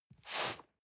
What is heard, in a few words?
cardboard box medium slide short; objekte